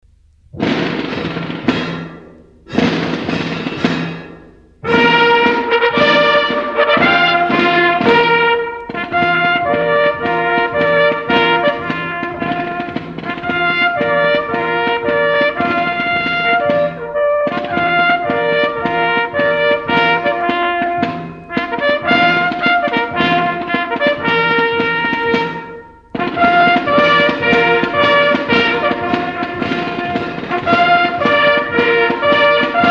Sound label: Band